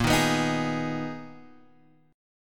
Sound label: Ab Minor Bb, Abm/Bb chord; Abm/Bb strummed; JGuitar